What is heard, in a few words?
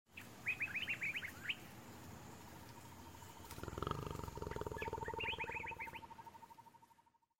3D Jungle